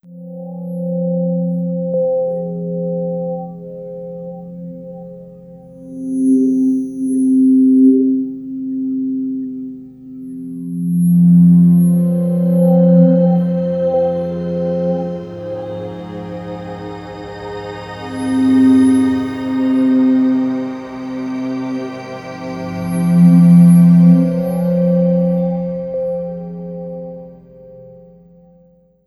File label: Melodic Bed; Sonic Foundry (Sony Creative Software); Slow Silhouette